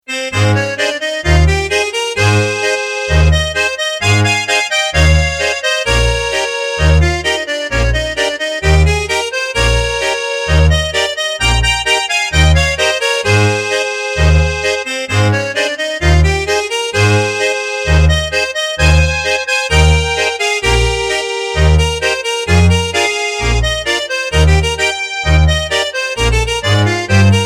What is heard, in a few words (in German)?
Polka